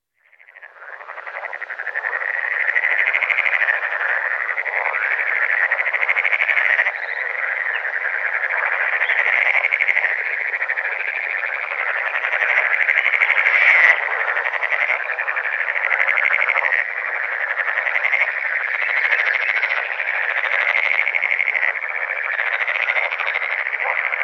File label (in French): grenouille verte